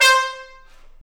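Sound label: brass trumpet stab; Roland L-CDX-03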